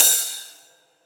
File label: Ride; FLPRO